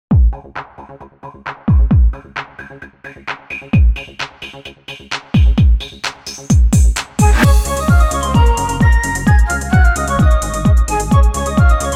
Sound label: portsmouth mayfair quickstep